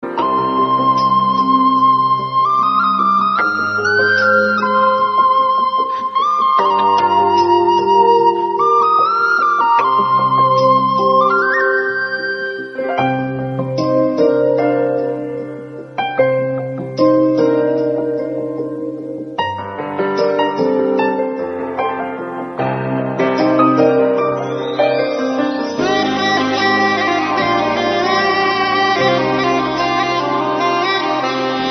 bgm; kamal haasan; anbe sivam

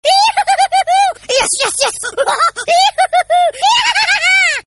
cheering

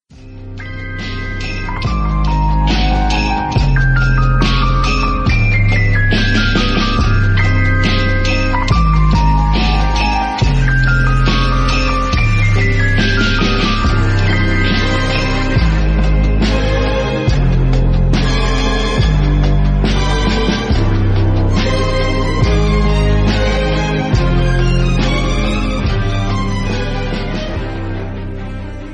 theme bgm; succession

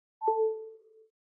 iphone charging sound

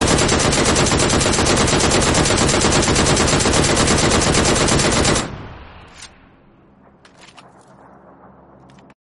dp 28 gun sound; pubg

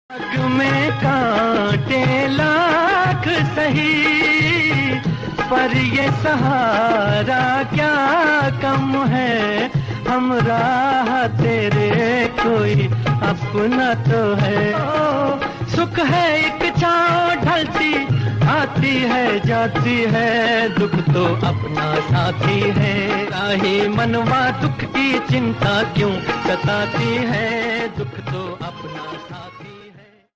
hindi song

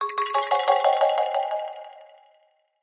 cool notification

